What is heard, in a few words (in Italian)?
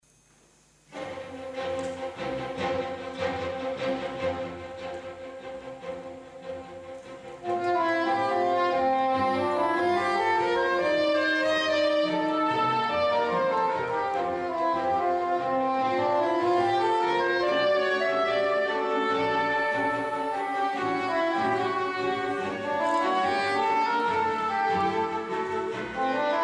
Concerto Per Sax E Orchestra